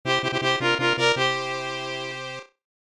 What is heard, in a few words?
Bonus Game